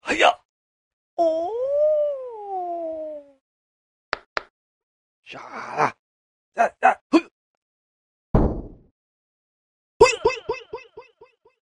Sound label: martial arts